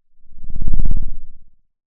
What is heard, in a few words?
pop